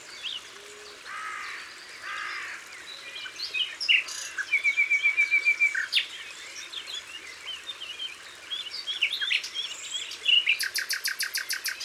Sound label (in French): Corneille noire; Isere; Concerts naturels de l'Isere; Studio Les Trois Becs